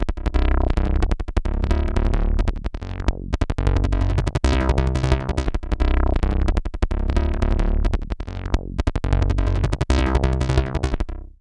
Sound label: BASS, BASSLINES 175; Masterbits; Tekkno Trax